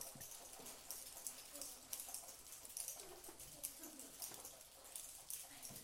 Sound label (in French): stylos